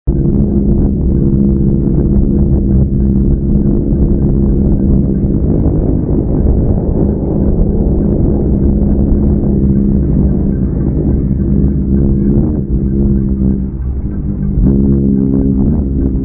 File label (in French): harpe rubans